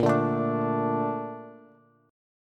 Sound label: Bb Major 7th, Bb, down, Bass; BbM7 strummed; JGuitar